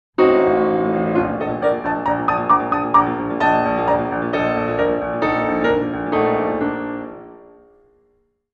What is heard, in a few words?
articulation